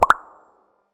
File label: bubble Pop